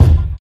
original kick one shot E key, Royality free bass drum sound tuned to the E note; kick drum samples